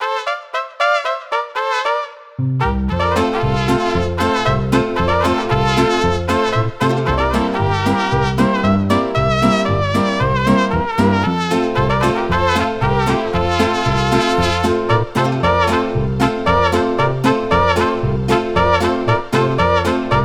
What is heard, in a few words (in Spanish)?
Polca Mariachi